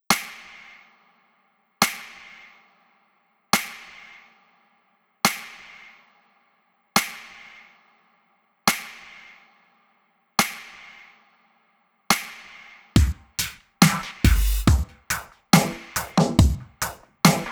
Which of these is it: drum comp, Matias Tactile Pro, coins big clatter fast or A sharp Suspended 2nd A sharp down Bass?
drum comp